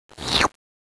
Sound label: chiup